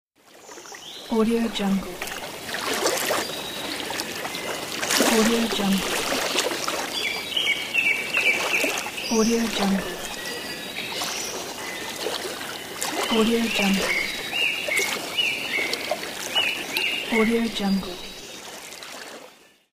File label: lake ambience